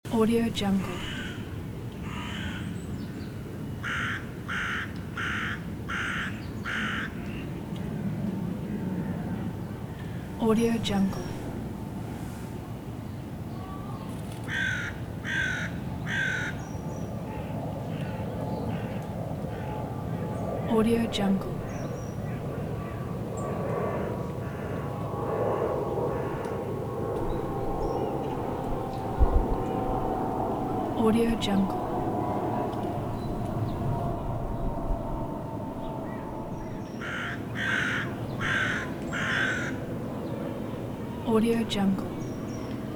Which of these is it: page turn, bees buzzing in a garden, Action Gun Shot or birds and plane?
birds and plane